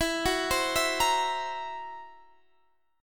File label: Gb 7th Flat 5th E